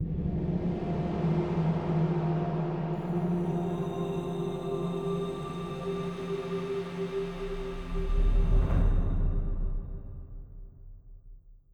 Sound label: mus desert monster intro; bluebyte